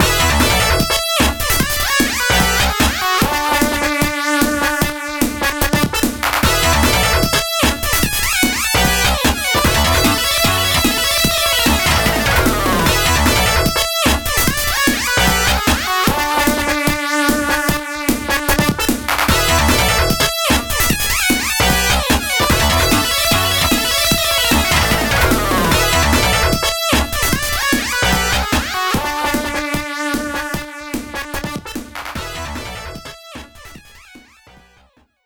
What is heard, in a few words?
Jingle - Speed Up; metal sonic hyperdrive original sound version genesis gamerip 2014; Yū Yū Hakusho - Makyō Tōitsusen